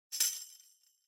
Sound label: Tambourine Headless Hit Body